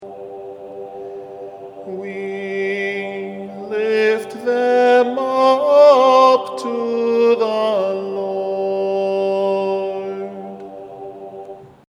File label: We lift them up, divine liturgy